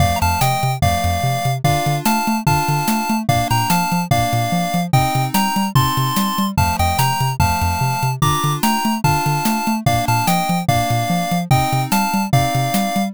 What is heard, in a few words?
bgm street